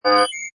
Hi Tech Panel Servo Button

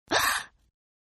Gasp Female; Gasp Aaah Female; Gasping; Voice

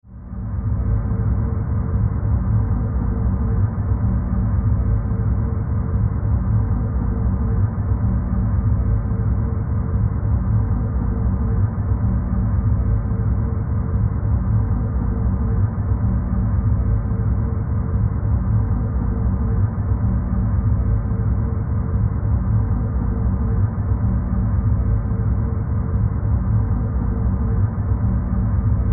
Horror Drone Supernatural Ghostly Dark; Creepy Door Sound Effects; Blastwave

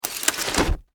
Household Umbrella Open Automatic; Umbrellas; Objects; SFX Bible

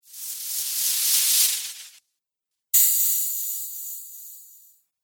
Magic Spells; Magic Sound Effects

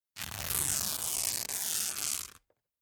Fabric Tape Cloth Long Tare Rip Rising Pitch; Ripping Sound Effects

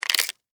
Insect, Eat, Chew, Bite, Bug; Crunch; Animals:Insects; Insects Eating; Blastwave

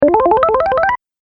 Zippy Bubble Bonus, video game sound effects

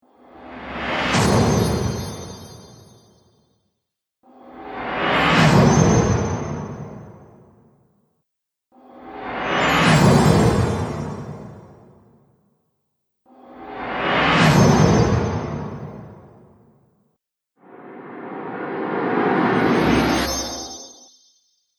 Trailer Magic Whoosh Sparkle Collection

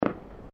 Fireworks Multiple Firecrackers; Fireworks (Sound Effects:Backgrounds)

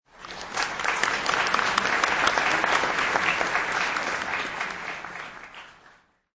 Concert

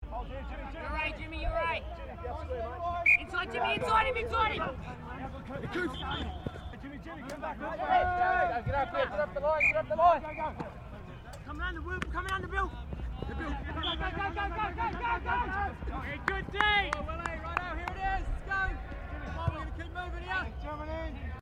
RUGBY LEAGUE TEAM TRAINING; Various rugby sounds; Miscellaneous Sports (Sound Effects:Sports)

